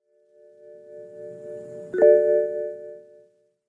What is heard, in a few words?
Bmw